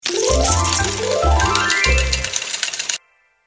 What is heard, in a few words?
Wins